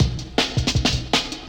160, classics breaks; Drum&Bass; EZRollers

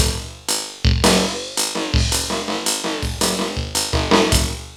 LOOP, TECHNO; TECHNO-BOX; ELEC-LOOPS

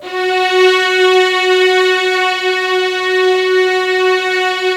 A.C STR F#3; AKAI S-Series CD-ROM Sound Library VOL-8

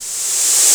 Rev MS20; Quasimidi Rave-o-lution 309

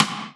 Gated Sidestick, Alesis HR16B

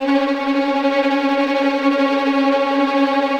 VI TREM F

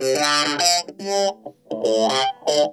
T-TIME, Funk Guitar; 90 s Sample CDs; Zero G

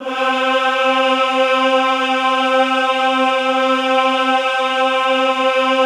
EHH C3, Classical Choir; Best Service; Extended Classical Choir; EHH MIX

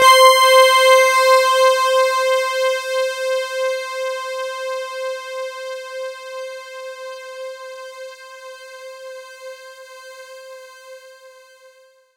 C5, Synth Line; 90 s Sample CDs; NiceBeats; SynthLine VOL-31

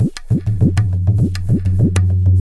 Pure Tabla, 100BPM; Zero-G

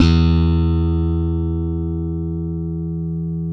SLAP BASS, Electric Basses

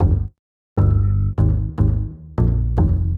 Standup Bass, Drum N Bass

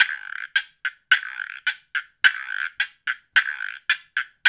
108 CHA, Cha Cha Cha, African Contemporary; Spectrasonics; Supreme Beats

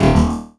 Spitter Hit, Esoterik Beatz; Spitter Kit

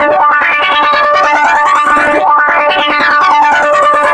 Trombone Effect, effected trombone; Sonic Foundry (Sony Creative Software); Jazz Solos and Sections

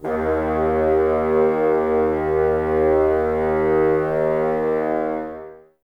Bassoons D#2; Miroslav Vitous - Woodwind Brass Ensemble

